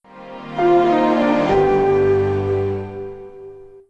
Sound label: Shutdown; Windows Never Released Soundpack 1